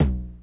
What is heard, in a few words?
LO TOM, COMPU DRUMS; Crypton - DrumBase5000